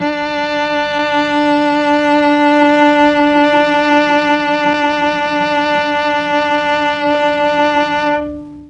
D3 300 CELLO, mellotron, STRINGS

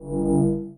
ambient soft buzz

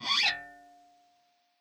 scrape, glowly bass

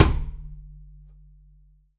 perc, pizz; meatbass

